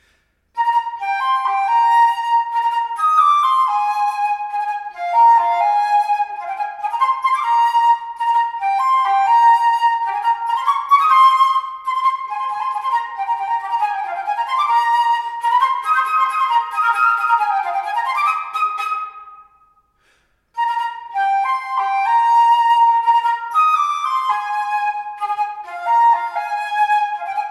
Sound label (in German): Floete Drosselpolka